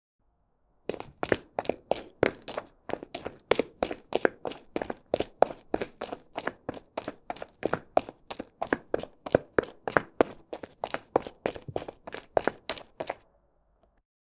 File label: the sound of running in, footssteps